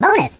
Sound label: bonus